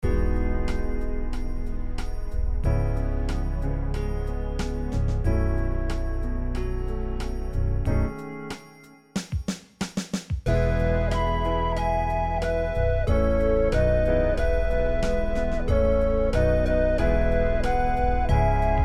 instrumental; New Hope Music

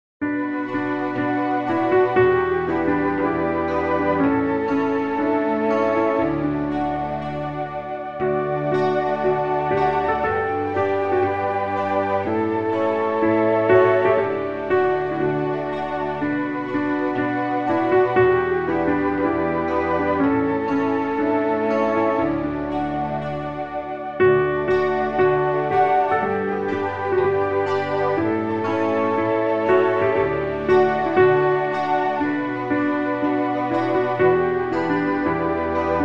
instrumental; New Hope Music

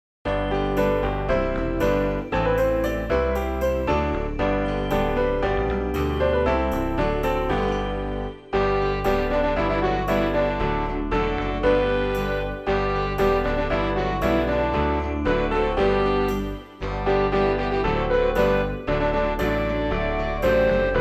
instrumental; New Hope Music

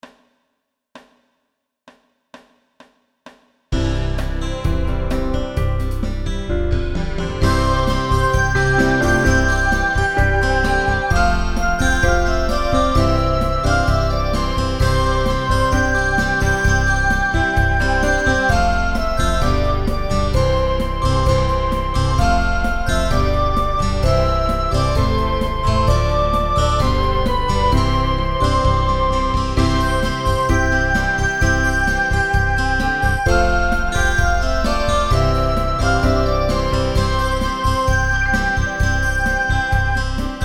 instrumental; New Hope Music

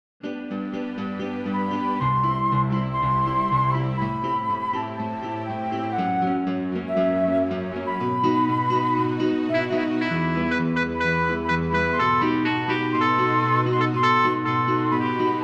instrumental; New Hope Music

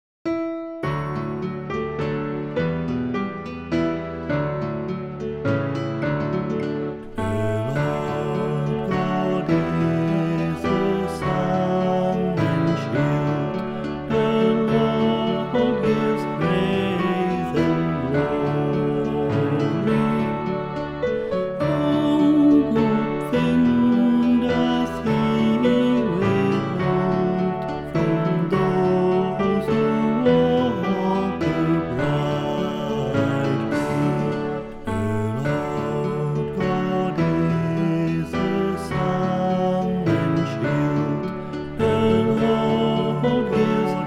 Round; New Hope Music

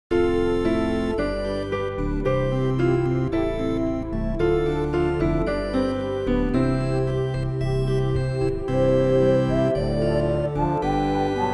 instrumental; New Hope Music

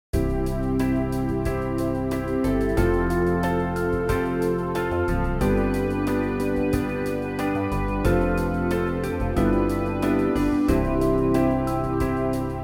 Land That Drinks In The Rain backing; New Hope Music

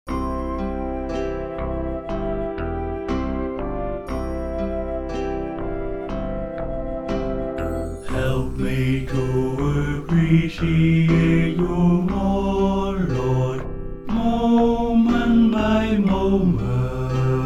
instrumental; New Hope Music

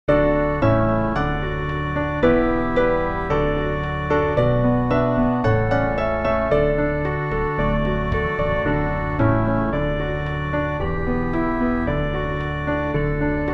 backing

